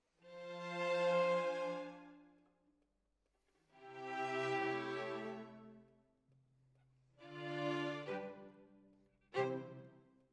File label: Violins, jazz for piano